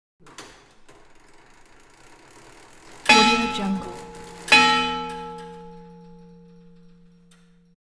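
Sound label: 19th century clock tower hour 12 bell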